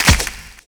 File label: SOME CLAP; Univers Sons - Kitch 70s